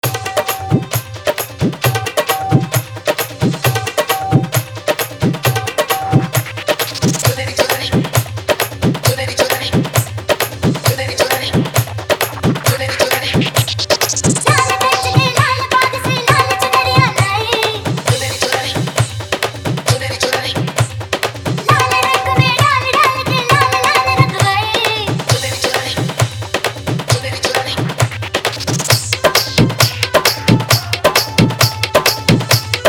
Hard Dhollki Bass Mix

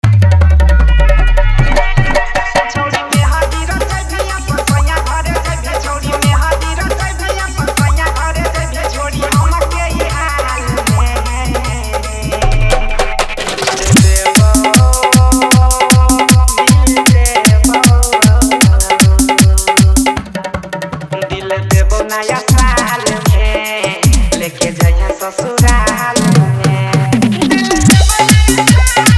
Hard Dhollki Bass Mix; New Year Spl

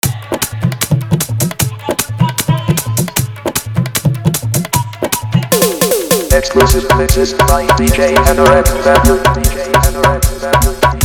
Sarswati Puja Fully Dhollki; Maai Sarswati Puja

